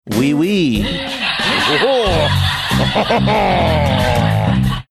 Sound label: saying oui oui stereotypical french laugh